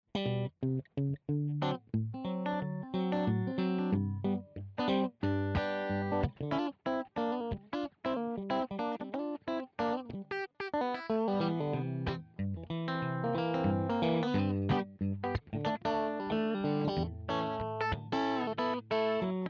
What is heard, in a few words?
snappy, guitar; LLV 66 PRINCE BLK